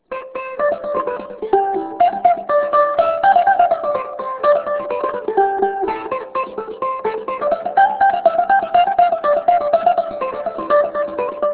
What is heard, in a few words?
lute; baltimore